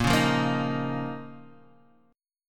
C sharp 6th Add 9th A sharp

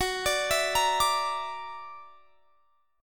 Gb Augmented 7th Gb down; Listen to Gb+7 arpeggiated

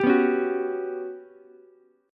G Major 7th Flat 5th, Ukulele; GM7b5 strummed

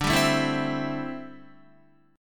D 9th Suspended 4th D down Guitar; strummed; JGuitar

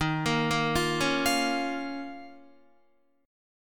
D sharp 7th Suspended 2nd, Guitar; D#7sus2 arpeggiated; JGuitar

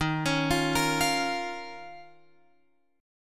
Eb 7th Suspended 2nd, Guitar, Open F; arpeggiated; x,10,13,12,13,12

